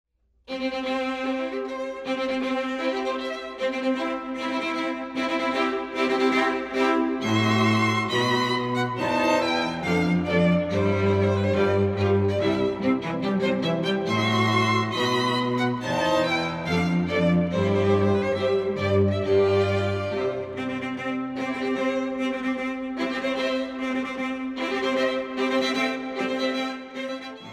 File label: divisi strings